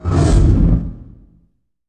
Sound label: IMP FLAME, Cinematic Impact